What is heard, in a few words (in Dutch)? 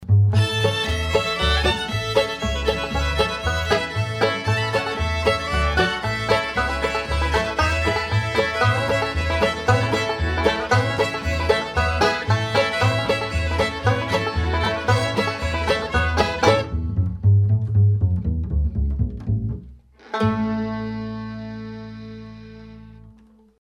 instrumentaal; Cripple Creek